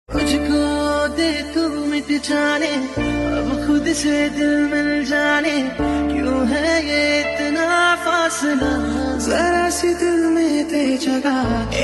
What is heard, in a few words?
hindi song ringtone, bollywood